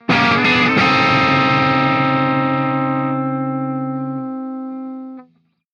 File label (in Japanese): 7th上がり